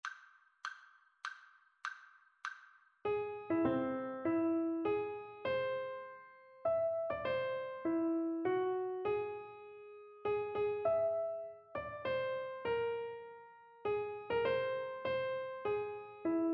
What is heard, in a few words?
melodic dictation; ear training